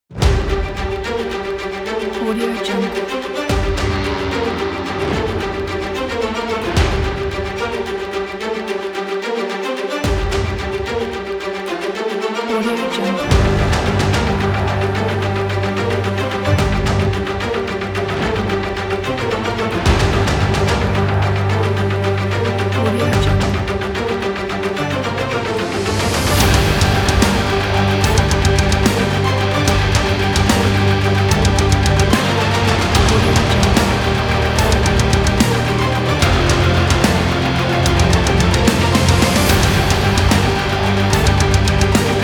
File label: action metal epic power trailer